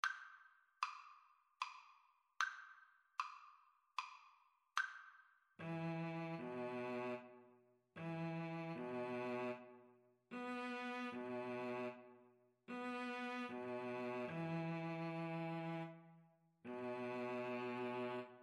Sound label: fourseasons, Viola Cello Duet; sum 1stmvt